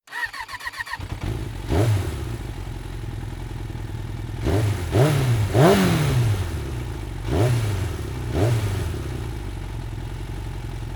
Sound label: motorcycle start and revs; transportation